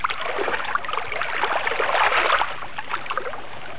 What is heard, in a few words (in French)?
eau